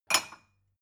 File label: glass take